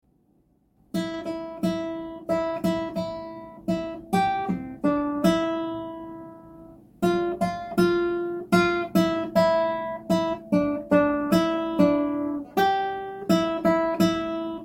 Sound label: Jingle Bells; بی کلام